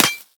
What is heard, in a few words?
Laser Impact Metal; Warframe